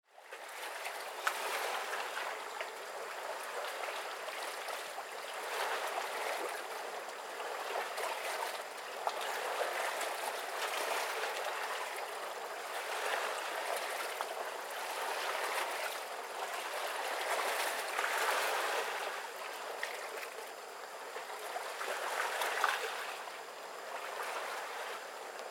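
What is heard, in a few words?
riyuetan, waterside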